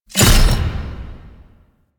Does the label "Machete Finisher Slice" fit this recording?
Yes